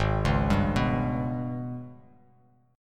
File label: Gsharp Minor Major 7th Double Flat 5th, Bass Standard; arpeggiated; 4,4,5,4